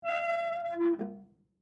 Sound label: Safe Door Open, Office, Large, Metal, Squeak; Metal Door Sound Effects; BlastWave